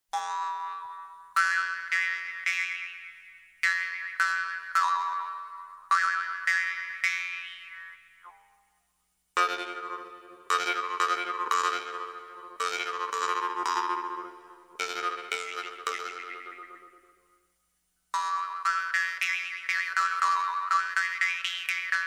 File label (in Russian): vargan; faces of russia